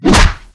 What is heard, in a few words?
claw strike; utah